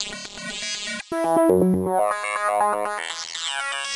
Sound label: filter to scale Ab 121, synth loop; Zero-G; Soundclash; Nu Dance Electro